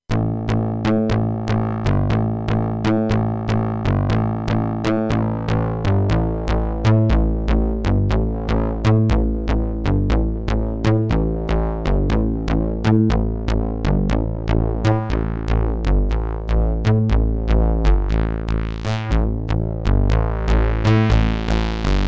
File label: UNO Synth; ik multimedia uno synth; سینتی سایزر و سکوئنسر iK Multimedia Uno Synth